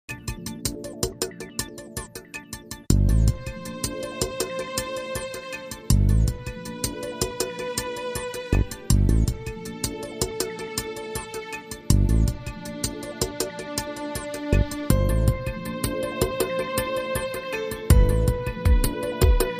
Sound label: Jubilee Groove